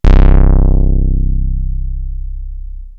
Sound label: ANALOG, BASS; Best Service; Hyper Dance